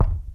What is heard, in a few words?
Thump Big